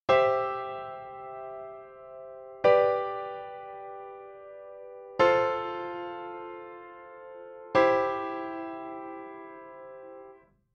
4-4-8 rotation prog

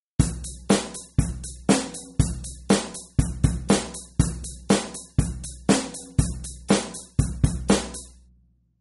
drum pattern

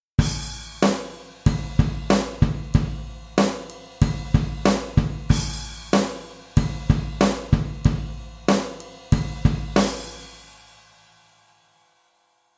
2 beat

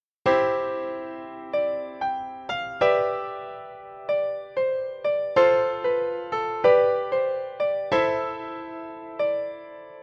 tension sample

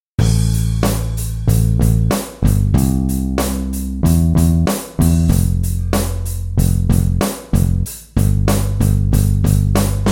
drum bass

